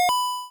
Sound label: Coin Pickup Sound